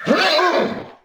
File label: hurt, horse; combat; creatures